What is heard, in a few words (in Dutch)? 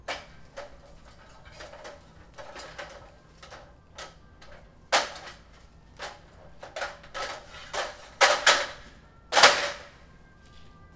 ladder tegen boom aan